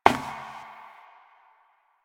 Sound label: Step Perforated Metal; Warframe; Koumei